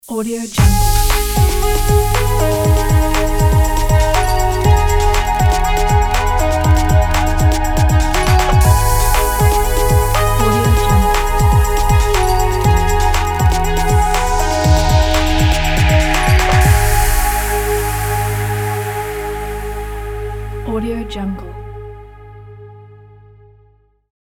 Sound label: technology corporate logo